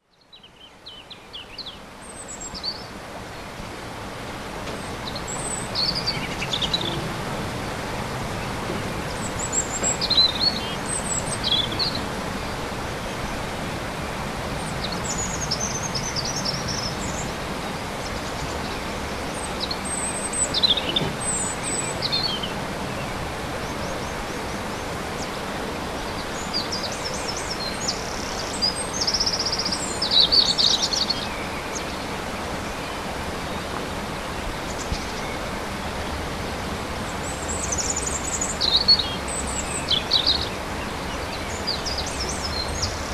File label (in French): Rouge-gorge et troglodyte